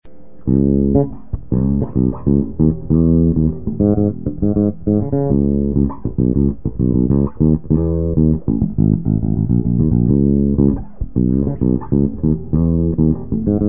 zaga fret mid

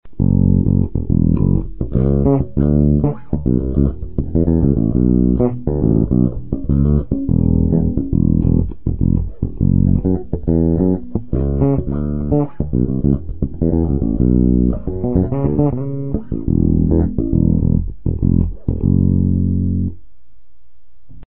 groove, baixo

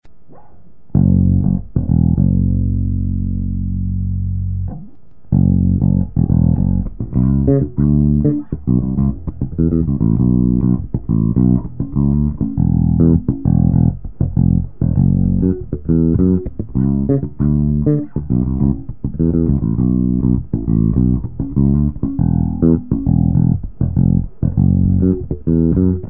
fret groove

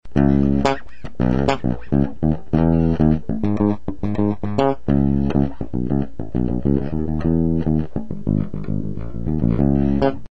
mid, baixo

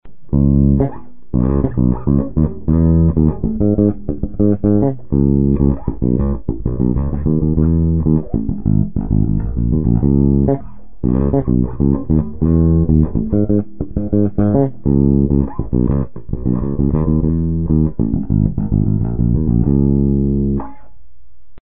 zaga fen mid

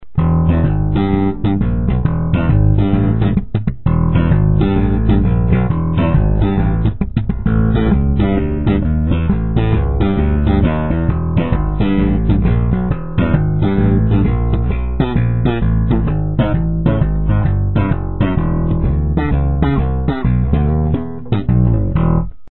fen slap, baixo

